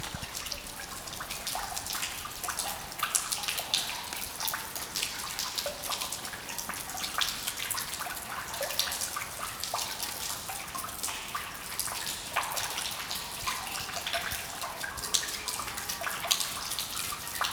Water Dripping; SFX; IRL Recorded

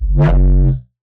Jumpscare

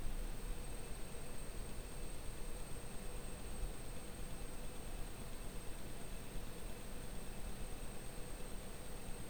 Battery Charger Noise; SFX; IRL Recorded

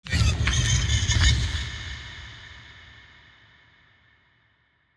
Firecracker in a bottle; SFX; IRL Recorded

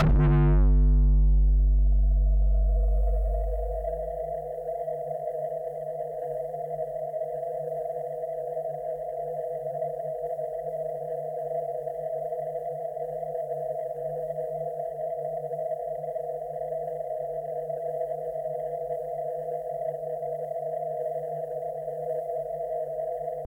Canal; SFX; Ambient - General

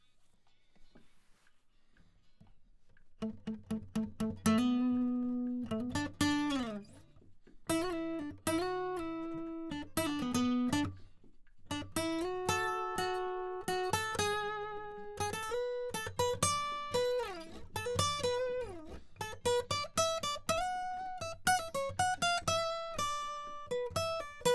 Solo; Reaper project

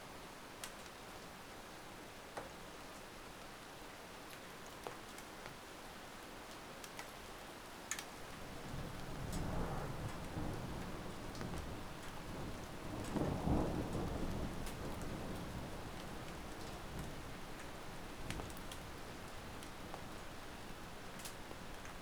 Storm Incoming; SFX; IRL Recorded; Rain - Thunder

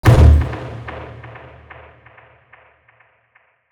Table Hit; SFX

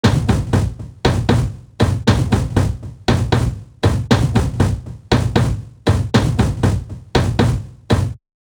Experimental Textures Low 118 BPM, loops

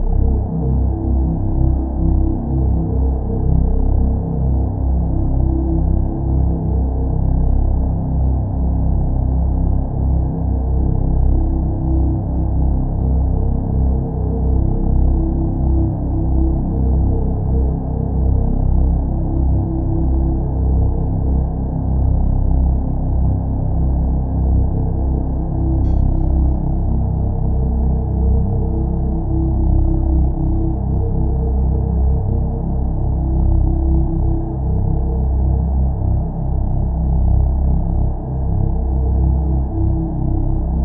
Reese Bass; 808

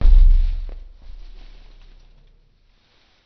Sub Impact; SFX

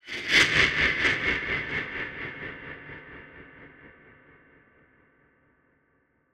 Sweeper; SFX; Ambient - General

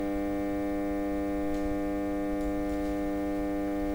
Electric Buzz; SFX; IRL Recorded

